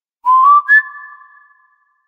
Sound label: Whistle